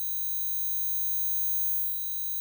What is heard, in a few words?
tinnitus loop